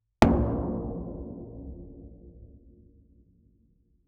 a large ancient drum being

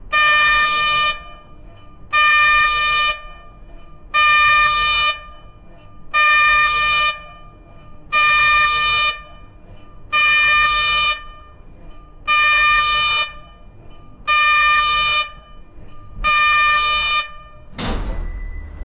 alarm siren in an industr; warning

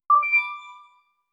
correct answer sound, interface

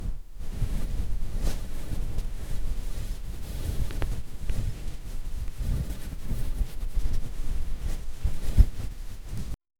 soft leather rustle; fabric

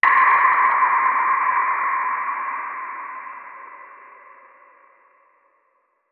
ghost being caught in a, haunted house

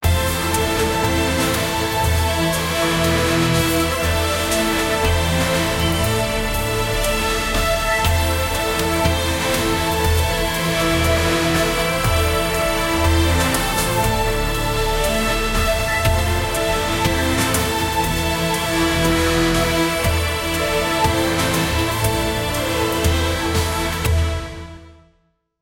epic movie trailer

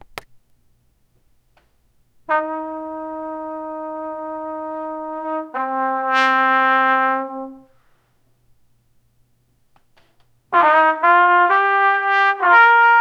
a trumpet playing a singl; brass

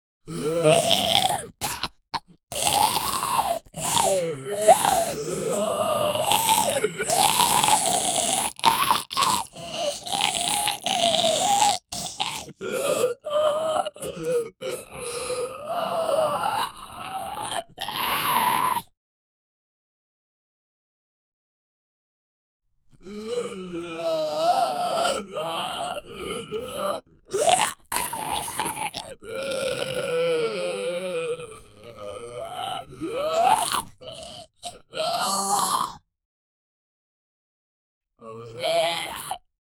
zombie choking on air

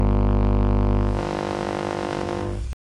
machine

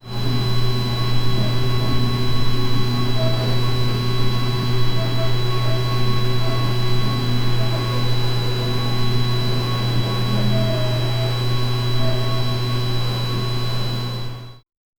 make sound of computers b, electronics

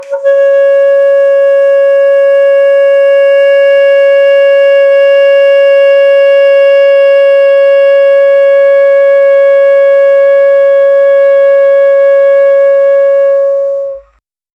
alto saxophone long tone

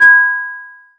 metallic ping alert sharp

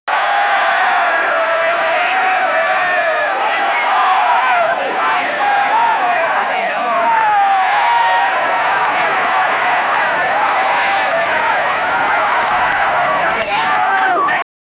dance battle crowd noise; events

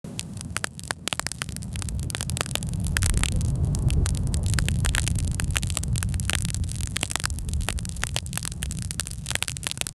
fire sound, crackling

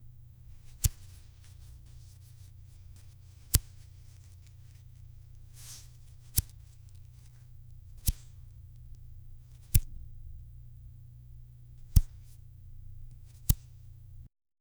twitching of hands; human behavior

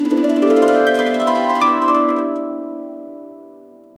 playful pizzicato strings; tail wag

